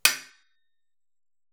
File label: ore punch; PixelDefense